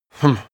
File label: male sigh disappointed